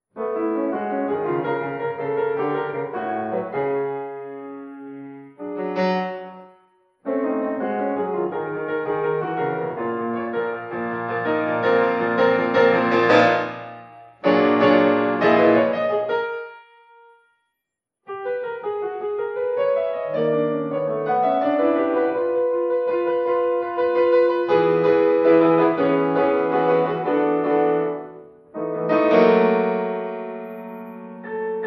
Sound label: Sonata Euphonium Piano Only